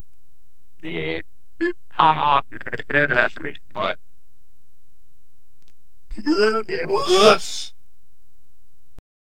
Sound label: baby screaming, anguished